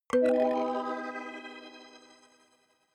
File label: notification kalimba magical octave low